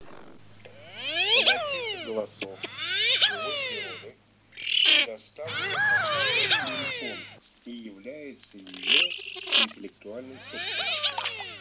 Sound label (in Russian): Красноголовый нырок Moriglione; plurifon